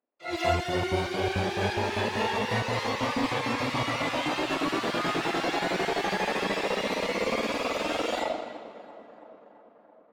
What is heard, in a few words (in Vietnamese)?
Am thanh nguy hiem tieng bass quay dai